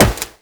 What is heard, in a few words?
Wall Jump Metal; Footsteps; WallRun; Warframe; Characters; Tenno